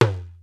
R8 ETHNIC perc; Rubber Chicken Software Sample Bank; DRUMS + PERC

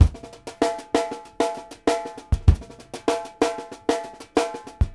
FANCY FILL, Drumworks; Sampleheads; New York City Drumworks VOL-1

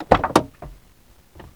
LOADING, Transportation

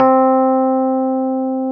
RHODES C3; Sonic Reality - Vintage Timetraveler

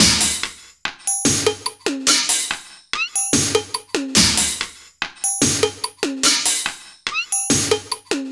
144 ELEC LP 4, Industrial Loops

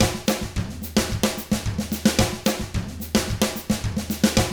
110 SAMBA, Drums; ILIO; Double Platinum Drums 1

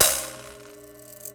Prepared Drums

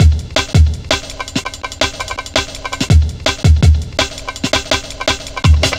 Drum Loops, 165.5, Jungle; Zero-G Jungle Warfare 1, 2 & 3

